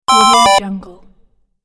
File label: 8bit harmony melody